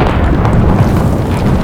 rolling rock

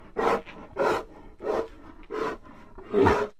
bear run

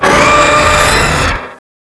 big flinch; tubequeen

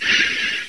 flashlight; items

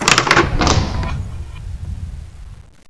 button

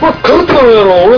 alert; votemap

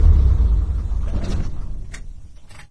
machine, ambience

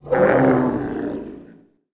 alert; unreal; titan

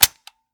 safe, guns ak74; cof

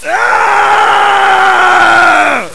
Die; USEC

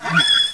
pit drone hunt; betapitdrone

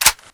ppsh bolt forward; weapons

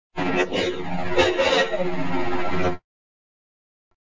doge death; keencity

